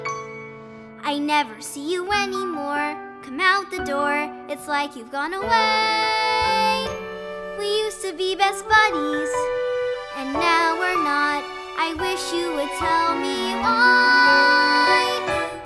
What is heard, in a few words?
Snowman Callback Cut Vocals; frozen callback materials